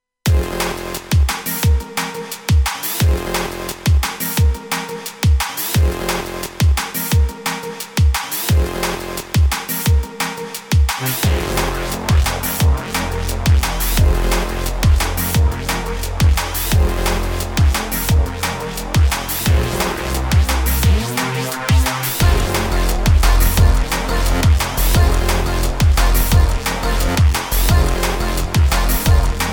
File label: Akai Force drumandbass